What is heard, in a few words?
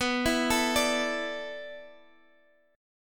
A Suspended 4th B, Asus/B chord; arpeggiated